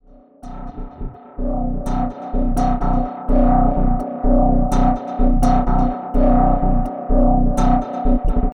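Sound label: Reverse Robotic Distance; Cinematic Metal Impacts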